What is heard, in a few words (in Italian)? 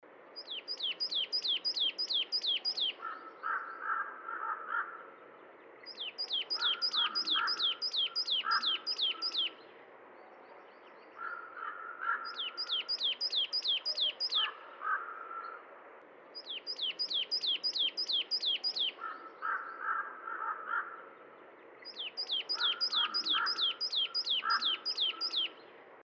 Corvo imperiale Cincia mora; valsolda